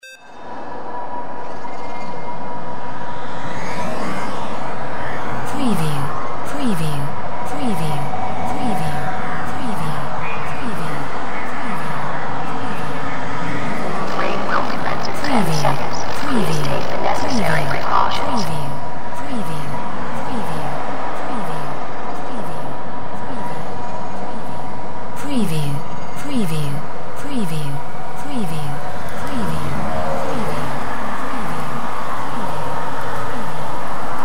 AMB CITY TRAFFIC VOX FUTURE

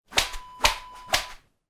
whip whoosh

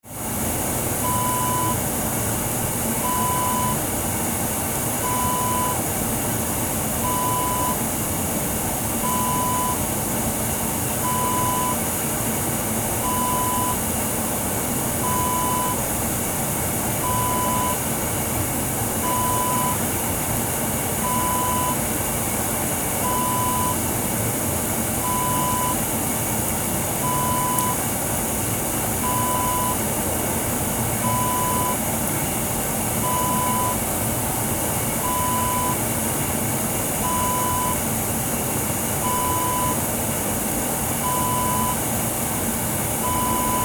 jacuzzi ambience

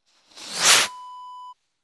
firework rocket launch

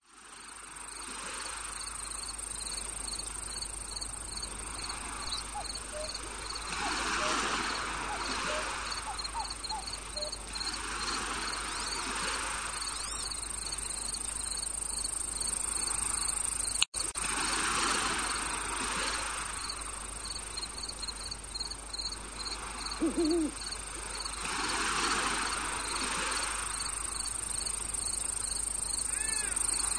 Tropical Beach At Sunset

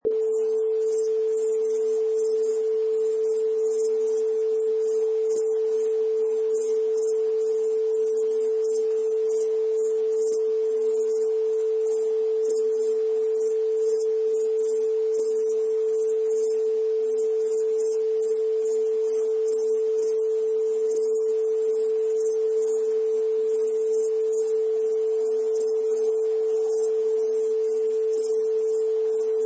Crickets

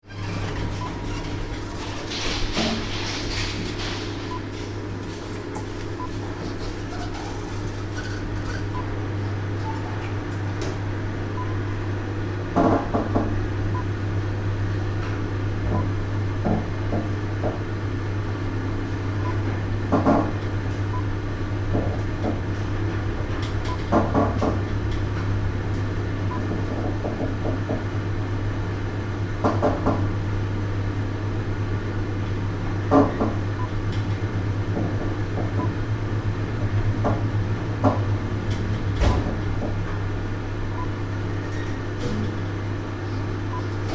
Hotel Lift

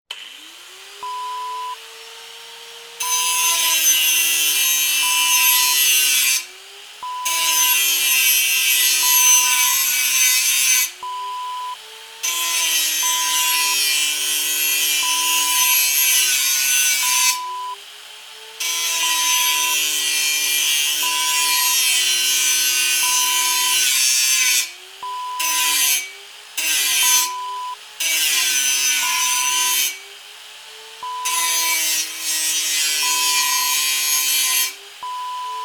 angle grinder

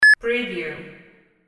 beep

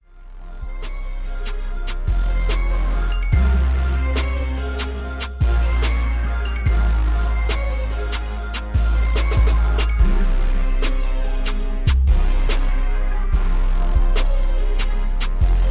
72BPM

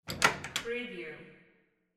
door open

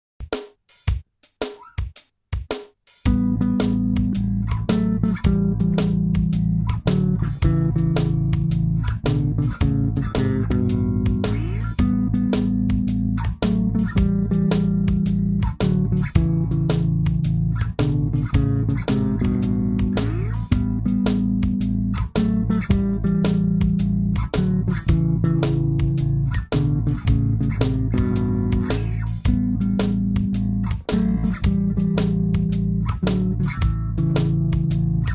Harmonizing A7

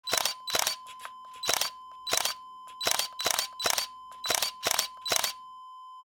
camera shutter

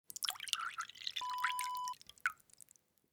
pouring coffee

